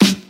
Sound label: low tone steel snare drum sound g sharp key, Royality free acoustic snare sound tuned to t